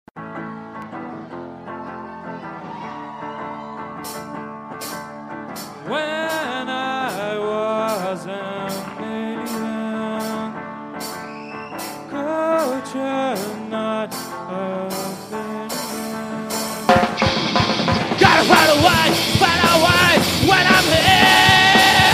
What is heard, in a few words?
cellos